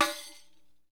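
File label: hi req open; Deff Bendir &Req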